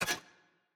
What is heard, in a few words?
Jaw Pin; Puzzle; Monkey